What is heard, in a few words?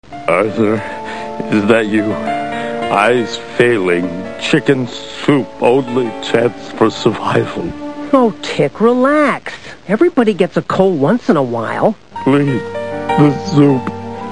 chickens; TheTick